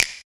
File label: snap, claps